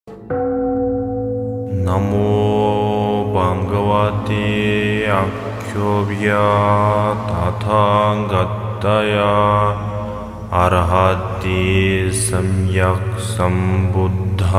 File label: Akshobhya mantra